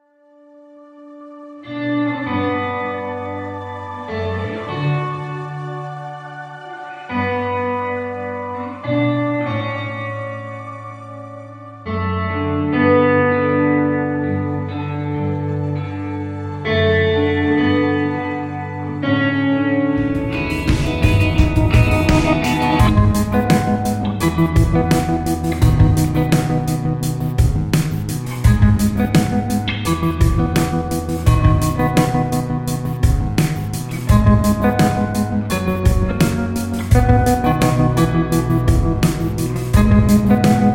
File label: ambient cinematic guitars; ambient cinematic guitars 3 music pack